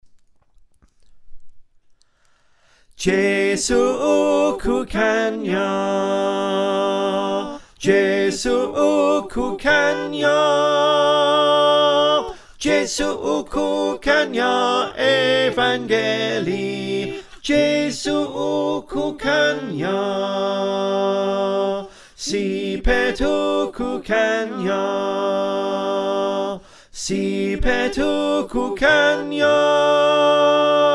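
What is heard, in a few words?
tenor, choir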